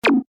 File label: Beep Button Chime, Scifi, Beep, Button, Chime, Science, Fiction; Tech; BlastWave